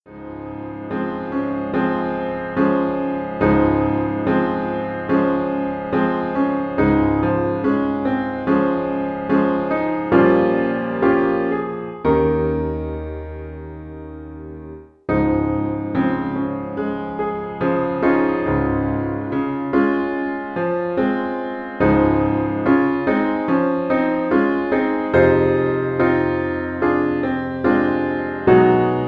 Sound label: advanced piano solo